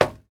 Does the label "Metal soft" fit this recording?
Yes